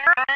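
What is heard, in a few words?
sphere blips medium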